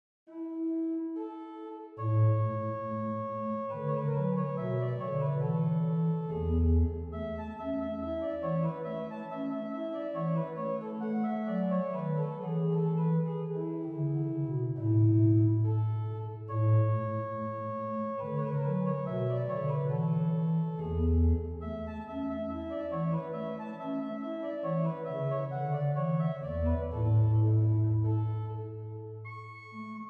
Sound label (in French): Recit Flute, Organ; BeauvarletCharpentier; Gloria; Souvigny